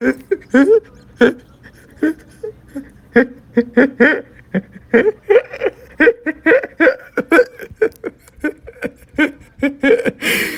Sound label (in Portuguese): risada